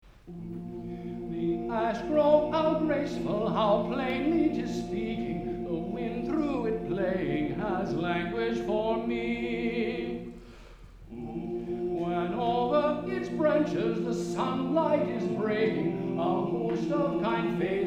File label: The Ash Grove quartet